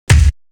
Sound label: Drum, Drum One Shots; AMG - Coldcut's Kleptomania VOL-1